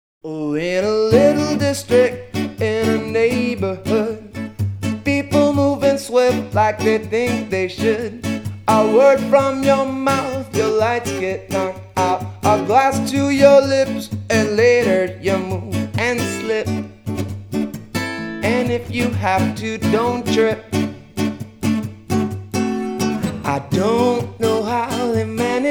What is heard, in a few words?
(Acoustic Version)